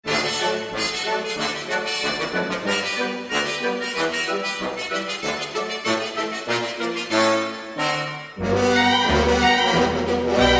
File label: fugue